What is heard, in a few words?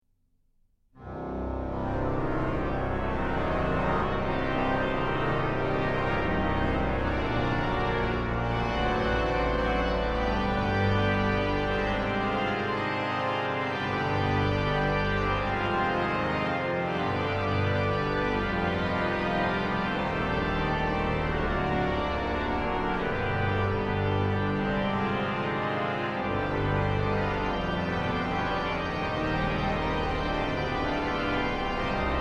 Konsert